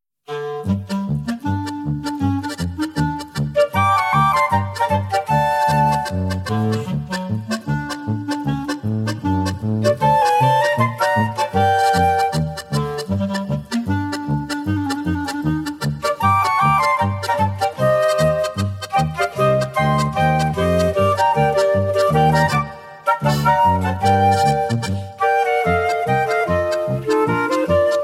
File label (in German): Polka